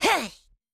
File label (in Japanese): 攻撃